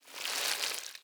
punch

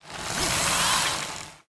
car robot move

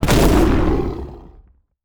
tiger drop

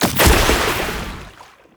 waterbubble

